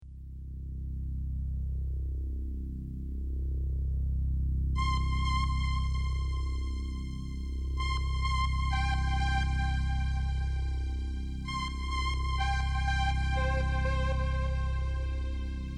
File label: e310u; Intro